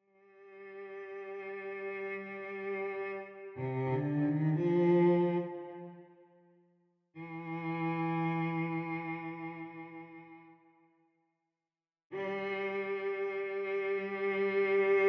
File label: Solo VC, strings; Kirk Hunter Spotlight